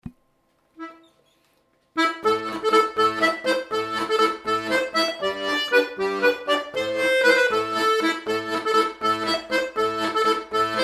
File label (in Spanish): fandango